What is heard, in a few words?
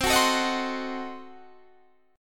C Diminished 7th C down Guitar Standard; strummed; JGuitar